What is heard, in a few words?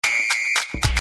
Vichiel Beep